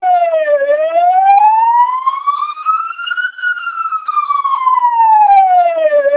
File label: siren twelp